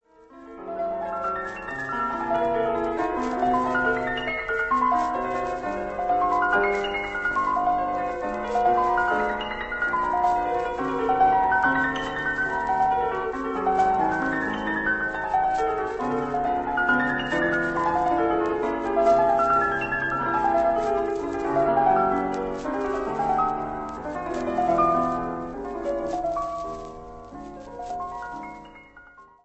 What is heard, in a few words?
piano etude; Deux études : n°1; Club français du disque